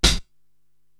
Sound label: HH Lofi Close, Korg TR-Rack drum machine